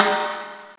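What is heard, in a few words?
Timbale, Yamaha SY22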